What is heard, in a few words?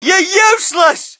crowd, shout; Mike Tyson Heavyweight Boxing